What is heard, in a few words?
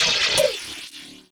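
HH, hi-hat; Sonic Foundry (Sony Creative Software); Esoterik Beatz; Quesy Kit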